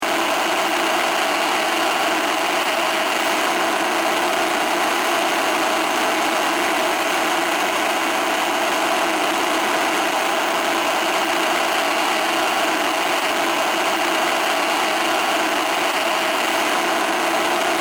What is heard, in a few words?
Technology Television Static; Television Sound Effects; Televisions (Sound Effects:Electronics); SFX Bible 6